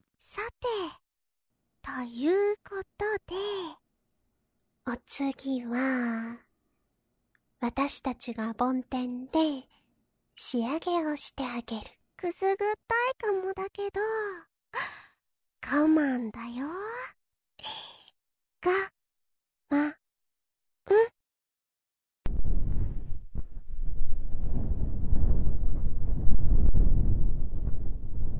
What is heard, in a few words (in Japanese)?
梵天(両耳)